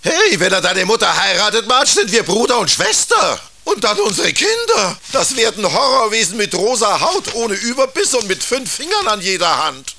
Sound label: Homer Horror; simpsons